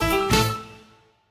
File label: small Win; jackhammer